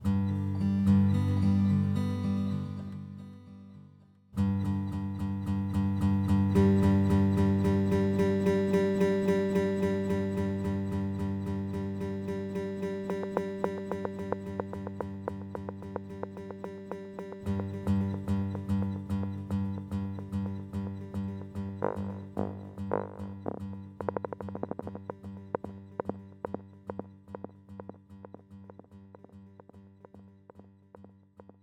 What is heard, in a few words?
Echo Cycles